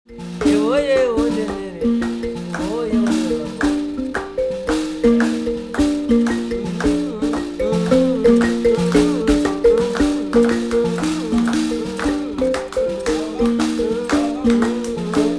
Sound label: mbira